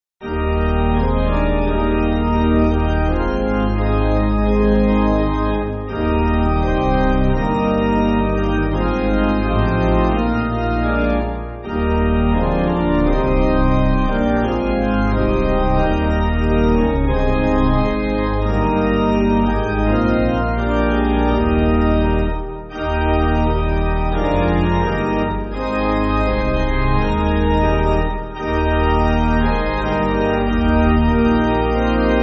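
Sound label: Pipe, Pipe Organ, Backing Files for Churches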